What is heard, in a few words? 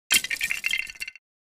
Lego Break Fall Apart - Sound Effect